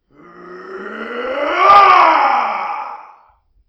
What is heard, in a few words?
rage